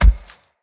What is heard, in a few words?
Step Default, Animals, Foley, Steps; Bromius